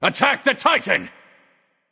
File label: vox attack; smite